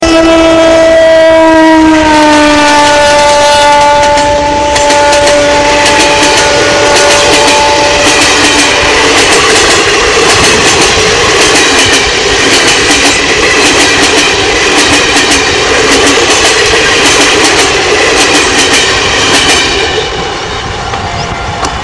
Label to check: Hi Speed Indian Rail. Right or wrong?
Right